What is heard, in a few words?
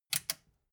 metal button click power on fast